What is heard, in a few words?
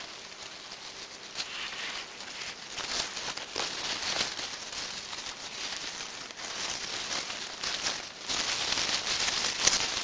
Leaves Rustling